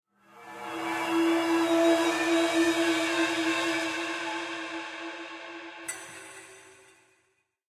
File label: Synth Section; Fortnite Save the World; macos; windows